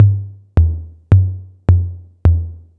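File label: TR-808 low tom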